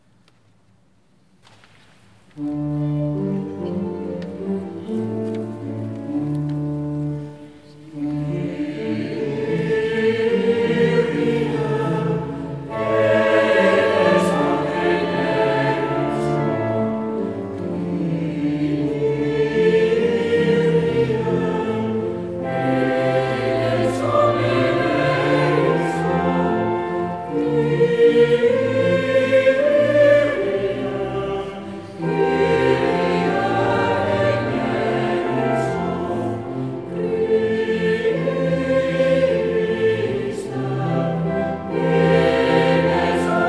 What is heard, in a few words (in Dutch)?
Kerkdienst KYRIE